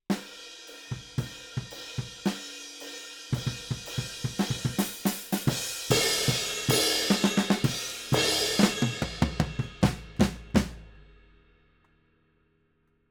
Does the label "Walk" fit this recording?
No